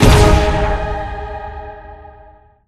Fuse Levelup; Spider-Man Unlimited gamerip 2014 android ios windows

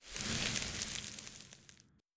Object Grow Flora; LevelEditor

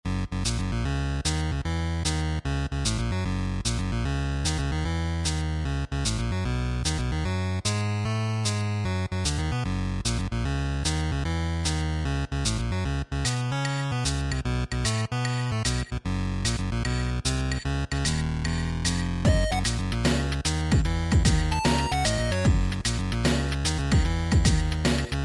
Bluesy; Flappy Golf

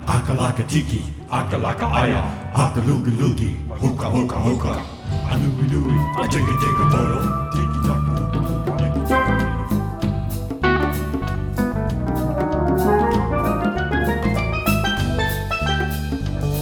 Bongo; Bongo #4 (Alternate)

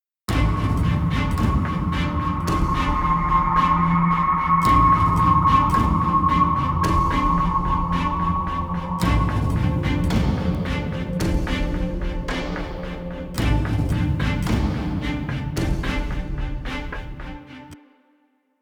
Monster Hunting; The Witcher 3 Wild Hunt; Skellige

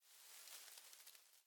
Hay Movement; The Town

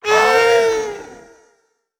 Ooze Hart Howl

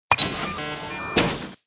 Menu Button Open; Angry Birds Space

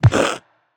SFX Shield Minion Hit; Android; Portal Quest

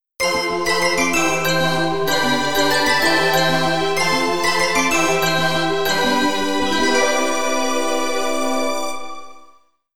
Game Over (Game Over); Gradius IV Fukkatsu

